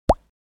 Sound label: Cartoon pop up